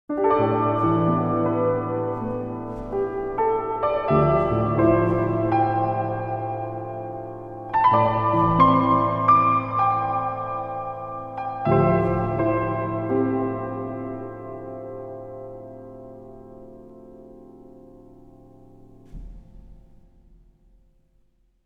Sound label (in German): Klavier